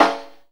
SNARE HIGHER, VINTAGE FUNK; Zero-G; Funky Elements

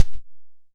Kick Bright Attack, Tama TS-206

